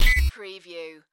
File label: Hi-tech Panel Servo Button